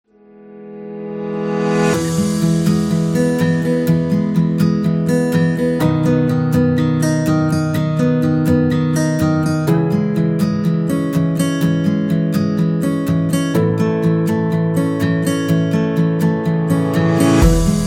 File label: Positive Thinking Corporate, corporate music; corporate music vol 21